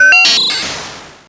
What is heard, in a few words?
POLYMOD; AMG - Vince Clarke Lucky Bastard